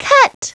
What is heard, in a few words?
cut; Treasure Galaxy (1994); Learning Company